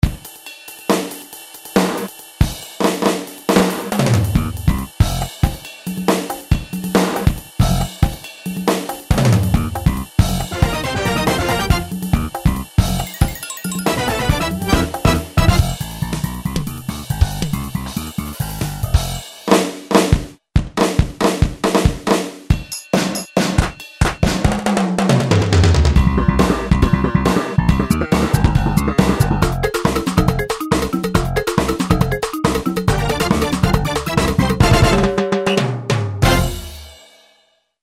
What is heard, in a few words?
DOWNBEAT, Yamaha RX8